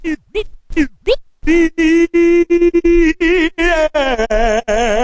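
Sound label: turntable transform; Scratching and Turntablism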